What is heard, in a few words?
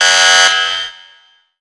alarm trainer civilian kill